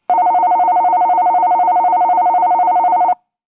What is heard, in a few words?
depbell; kitakyu mono